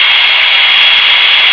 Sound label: SAW RUN